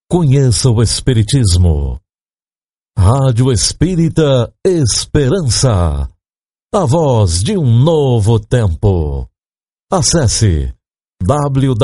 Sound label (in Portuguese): vinheta